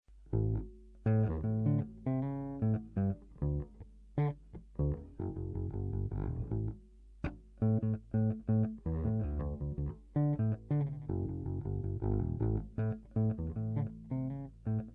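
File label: 1969 EB3, bass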